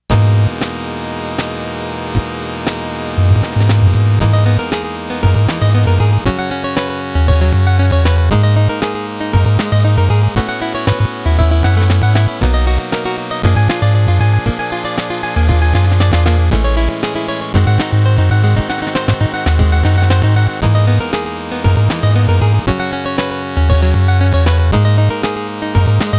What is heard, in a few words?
Town (Chiptune version); The Dark Spire Original Soundtrack 2023